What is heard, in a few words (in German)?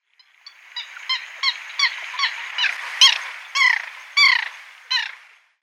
Bronzesultanshuhn Porphyrula alleni; Vogelstimmen